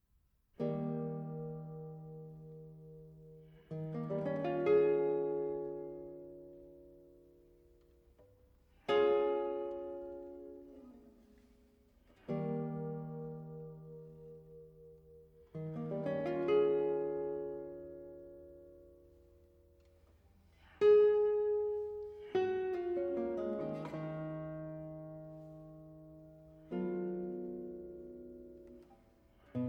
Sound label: guitar